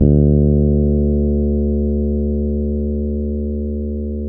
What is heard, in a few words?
bass, D 3; Spectrasonics; Bass Legends CD 1